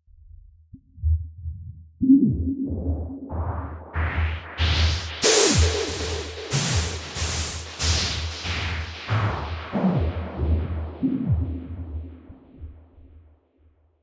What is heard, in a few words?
Pink Noise Effix Delayed; Techno